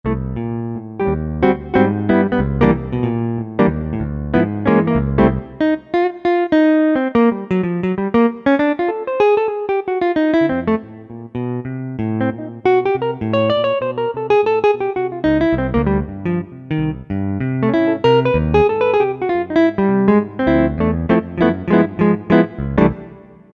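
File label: Jazz Guitar, Roland SC-50 Sound Canvas; Factory sounds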